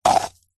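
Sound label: foley coconut shell 2x halves empty scrape turn hollow short; zapsplat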